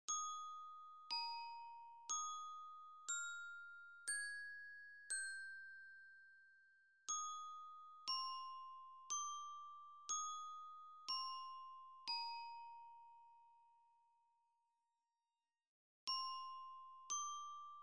orchestra bells chorale and recessional

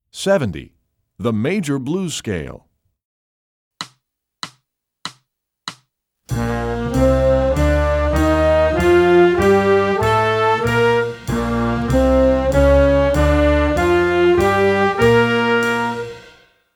the major blues scale

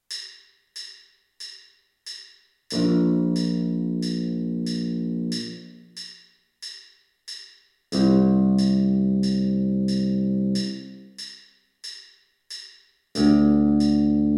band on parade electric bass

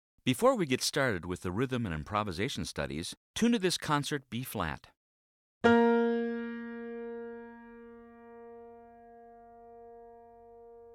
b-flat tuning note

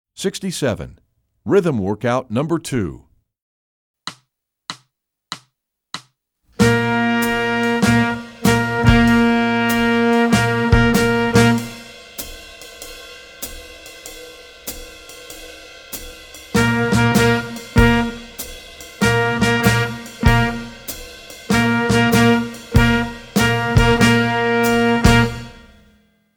rhythm workout

